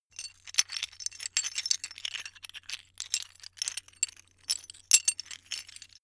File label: metal pieces clatter